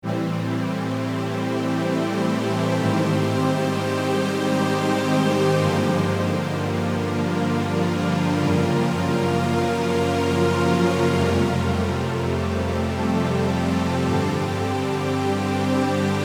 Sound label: pad filt